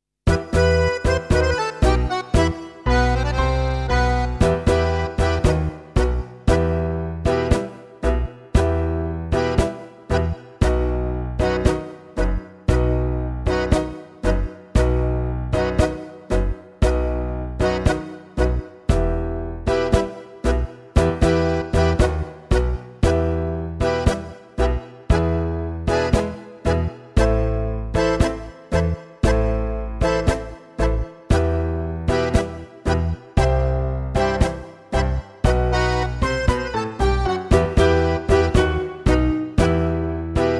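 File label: Tango, gitaar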